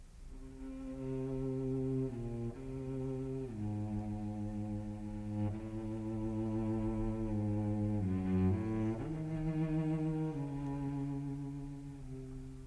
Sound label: Concerto for Cello and Orchestra in E-Flat Major; Shostakovich; The Philadelphia Orchestra; Great Performances